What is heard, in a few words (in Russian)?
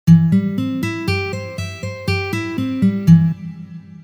Arpedzhio na gitare Do mazhor